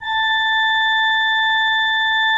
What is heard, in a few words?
A4, Cathedral Organ; Propeller Island